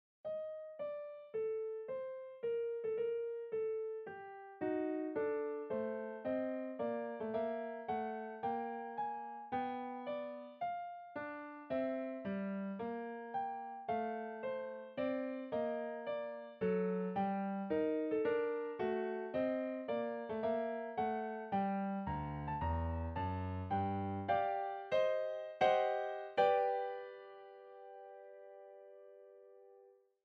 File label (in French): Mouvement Pour Piano; Ludwig van Beethoven